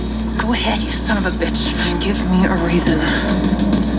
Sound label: sob